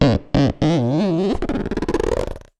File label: Wet finger on book, cartoon Foley Fx; musicradar; cartoon caper